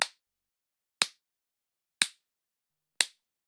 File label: Generic Click Plastic Small